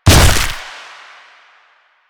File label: Proto Infested Ancient Footstep; Enemies; Warframe